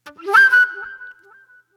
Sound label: Sharp Delay Flute